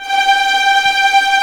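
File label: G5 tremolo, strings; East West; The Ultimate String Collection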